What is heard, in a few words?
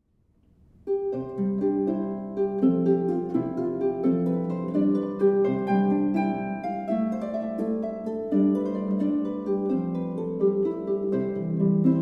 Irish, violin and harp duet